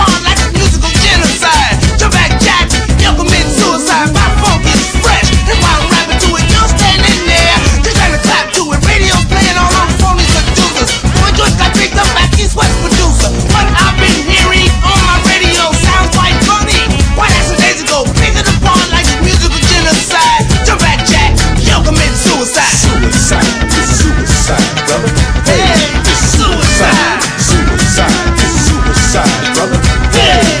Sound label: cacaphon